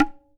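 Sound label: TABLA mute left; WorldSounds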